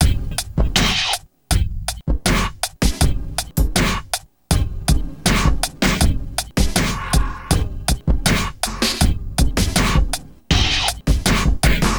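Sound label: Loop, beats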